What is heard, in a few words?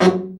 BRA Bari, SAX; Best Service Gigapack 1; BR SAX 2